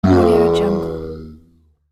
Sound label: giant ogre troll